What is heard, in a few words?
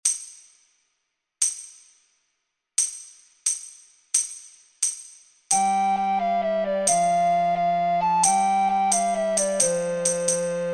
13th C Dance slow